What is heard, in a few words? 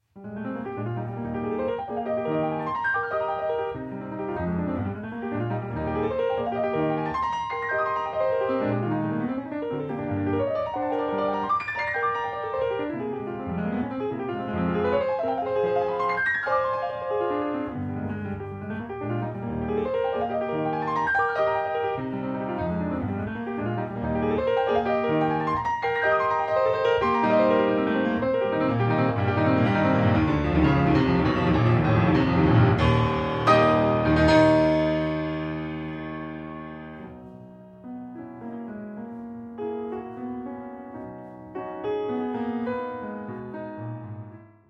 Piano Sonata No. 3 in B Minor Scherzo. Molto vivace